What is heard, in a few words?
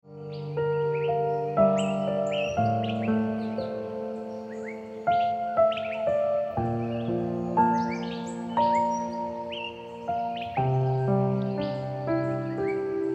Ringtone Piano Music